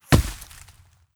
generic bullet impact; Rust

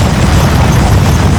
charge close

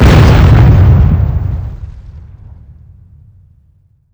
Explosions Explode Air; DCS

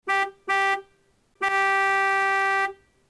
Horn; Civ4 warlords

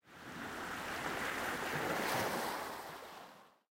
water splash; War Thunder

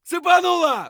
hurt light; Escape from Tarkov

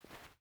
footstep burlap cloth walk; Rust

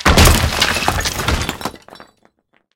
fence stone crash; tanks; War Thunder

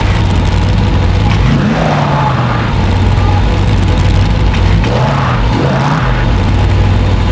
engine med speed loop

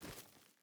footstep burlap dirt walk; Rust

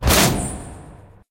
streak mission complete; War Thunder

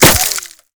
impact wood; weapons; Modern Warfare

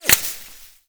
impact foliage; weapons; Modern Warfare

